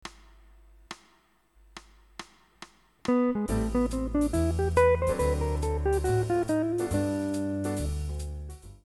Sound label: ii V I Licks